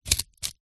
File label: office scissors cutting cardboard single